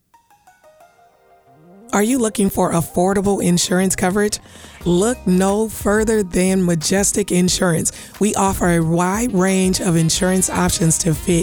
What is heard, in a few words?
Majestic Music